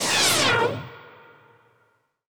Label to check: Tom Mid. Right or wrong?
Wrong